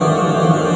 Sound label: QUAKE; Q Up Arts; Dream Experience; CLUSTER